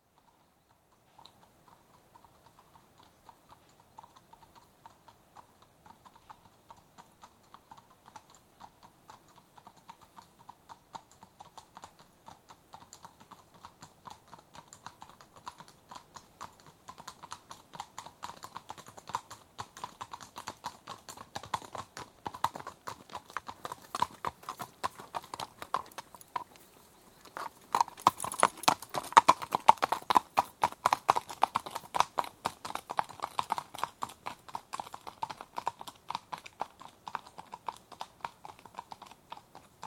Two horses at walk on tarmac, approach, stop & depart, right to left, some wind in trees; Horses Walking; BBC